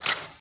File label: boost window open; rage of the seas